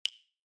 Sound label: ui hover